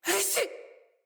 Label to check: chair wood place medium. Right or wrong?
Wrong